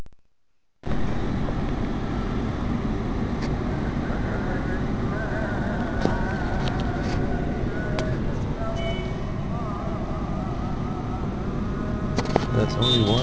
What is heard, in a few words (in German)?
Gebetsrufe